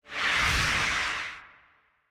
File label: ghost run; smite; zhongkui